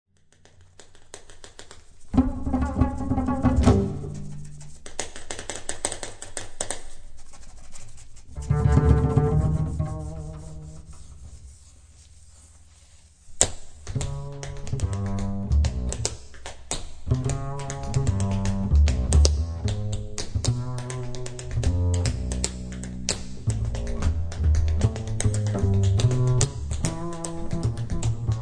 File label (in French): duo concert contrebasse jazz contemporain experimental improvisation musique improvisee